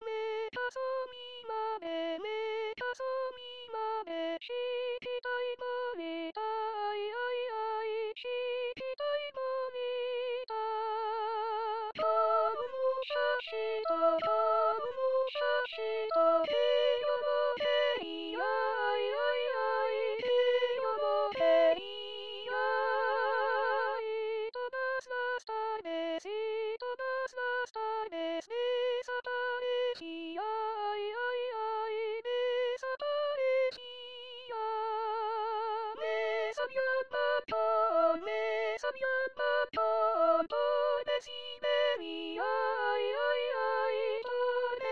me caso mi madre BAJOS

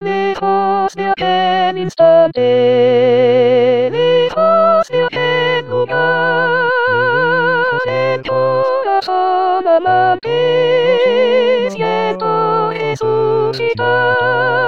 voz; Coro "Con dos Bemoles"

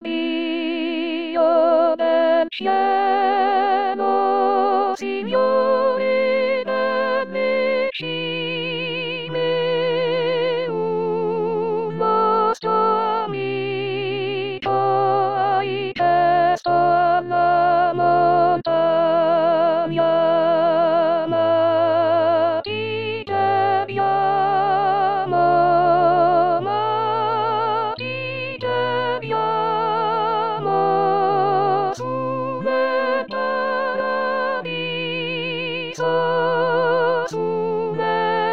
S voz

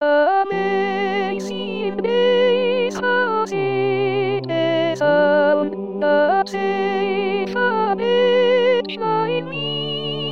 SOPRANOS; Amazing grace sol

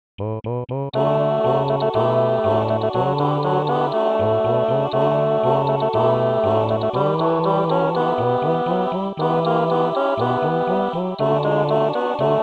32 la banda TODOS voz, con voz; Coro "Con dos Bemoles"

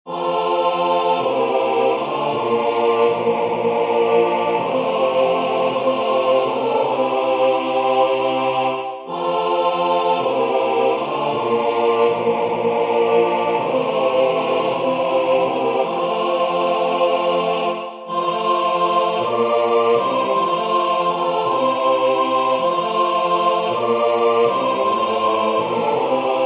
Ejercicio Colocacion SATB

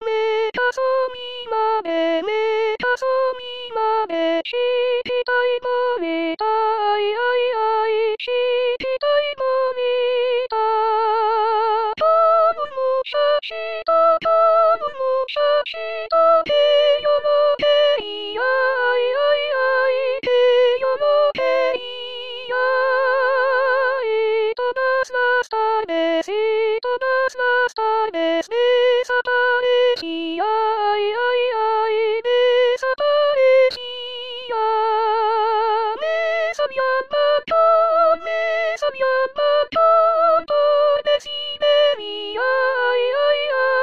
me caso mi madre SOPRANOS

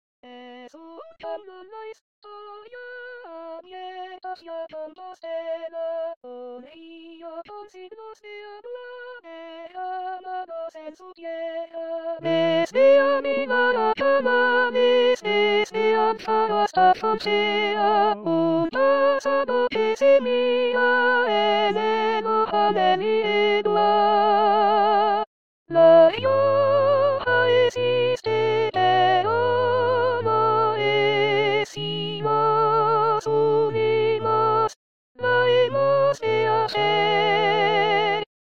39 la rioja T voz, con voz; Coro "Con dos Bemoles"

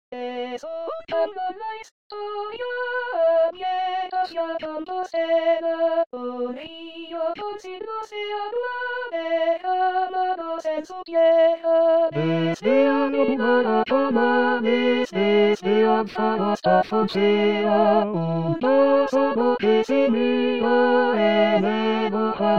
la rioja, todos, voz; Coro "Con dos Bemoles"

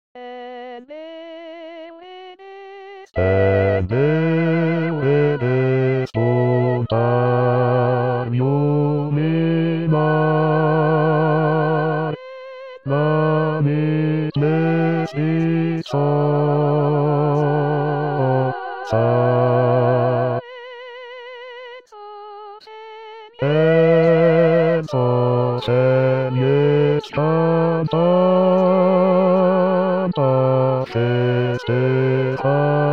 voz; Coro "Con dos Bemoles"

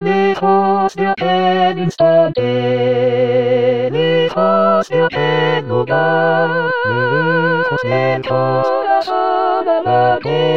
maite, todos, voz; Coro "Con dos Bemoles"

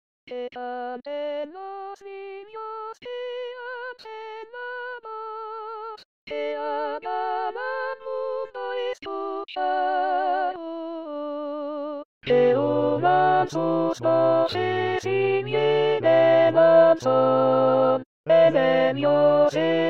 canteninos, voz

